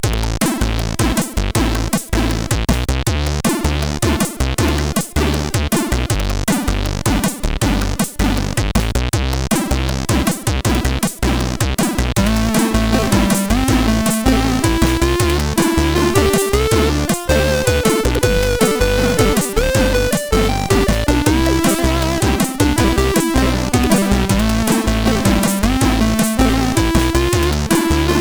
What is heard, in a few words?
CSG8580R5